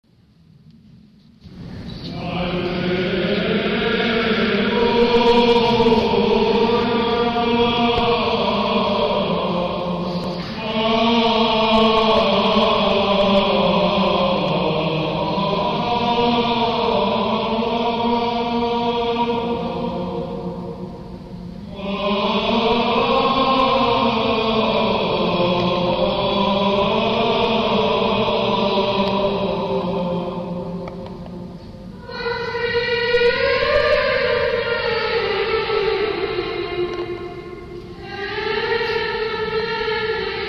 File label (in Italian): alleluia, canti ambrosiani